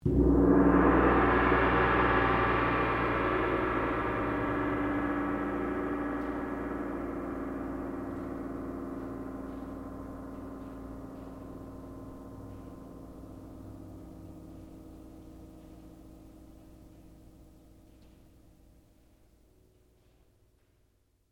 Tam Tam Strike, Japanese Tam tam; Drums; Musical

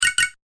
Pick Up Tokens Game Sound; Video Game Sound Effects; electronic sound effect

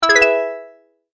multimedia tone advance

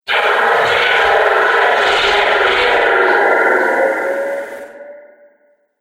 Laser Future Tunnel Beam; Laser Sound; Sci-Fi Laser

